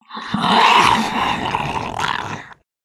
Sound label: female zombie attack; nmrih; zerosiki; slender